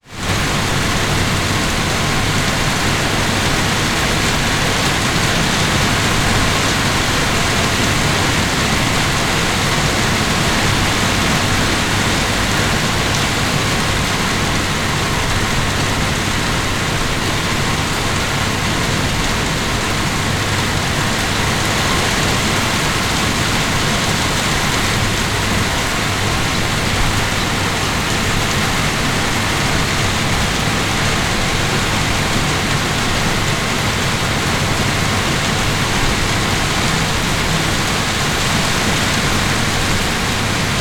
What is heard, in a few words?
nature heavy rain on house metal roof recorded inside